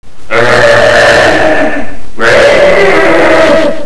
man scream

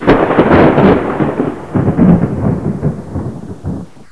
old thunder

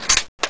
K98 bolt

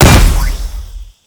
medic nade

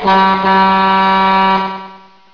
truck horn

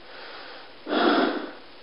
breathe

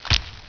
chum toad step

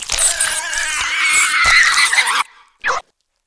hornet gun draw

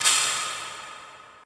pickup

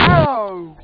teleport

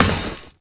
jump metal grate

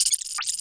hunt, Snark; Half-Life

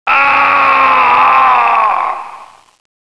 die screem

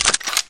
clipout; paranoia

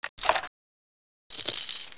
m60 reload full, weapons

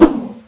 bear claw

